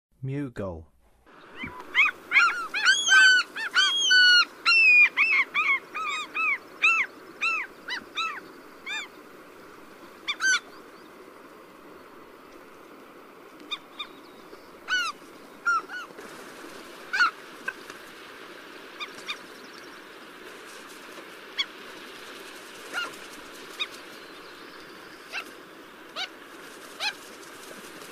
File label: Mew Gull, Songs of Birds of North America; Western USA; Western USA Songbirds